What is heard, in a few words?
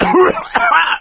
cough, survivor voice; Left 4 Dead 2; coach